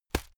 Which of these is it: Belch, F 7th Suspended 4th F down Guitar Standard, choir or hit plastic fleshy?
hit plastic fleshy